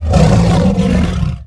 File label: roam, Dragon sounds; Unreal